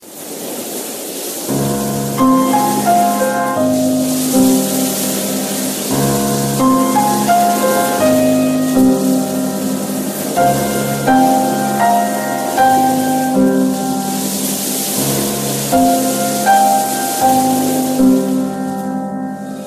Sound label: note 2 ringtone; moonlit beach